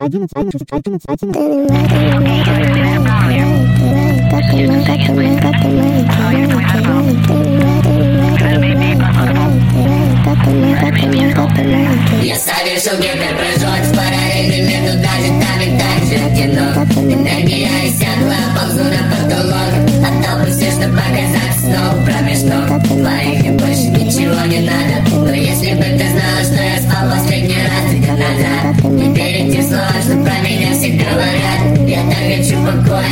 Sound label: nightcore